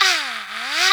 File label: A WS HAAA, VOX; CMP - Analogon